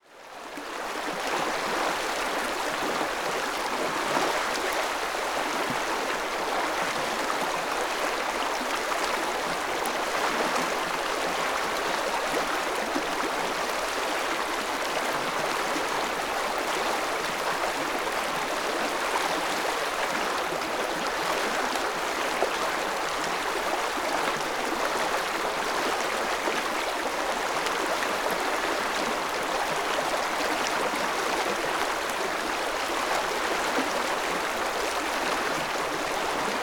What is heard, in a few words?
Water River Medium Flow Medium Distance; Rivers & Streams